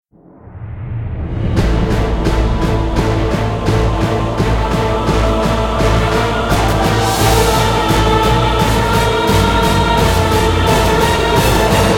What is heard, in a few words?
Monster Build